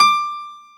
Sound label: PF, GRAND PIANO; AKAI S6000 CD-ROM - Volume 1